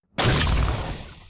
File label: shot